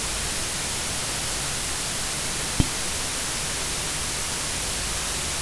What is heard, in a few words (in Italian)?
Tocco su microfono